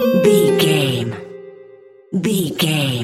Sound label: jingle chime negative